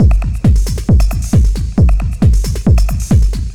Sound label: Drums Techno